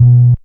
BAS TON; E-Lab; X Static Goldmine 2; akai

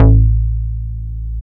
bass

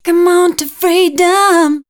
voice spectral, ly short

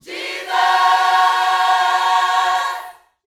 Vocal Gospel PHRASE

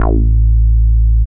BASS; E-Lab; X Static Goldmine 1

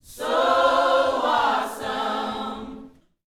YOUTH CH PHRASE, Vocal Planet Gospel

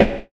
SNARE; E-Lab; X Static Goldmine 2; akai

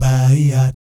BAYEEAT, Vocal Planet Jazz Fx; Spectrasonics

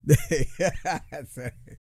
LAUGH, Vocal Jazz Fx

